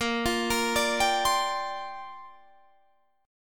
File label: A sharp 6th Add 9th, Guitar Open D